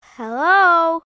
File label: Sewer Door; the unfinished swan; Monroe VLG